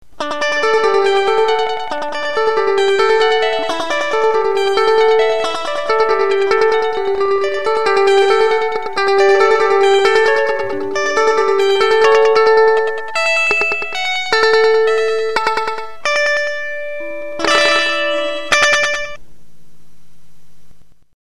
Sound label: delay